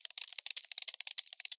crawl, insect; ambient

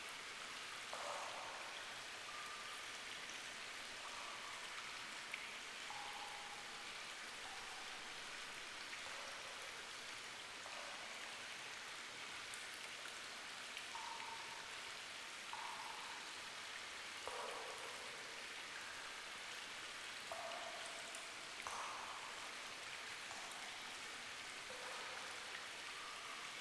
cave water, ambient underground

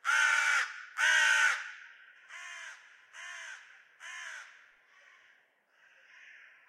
crow; minecraft; Remastered; ambient

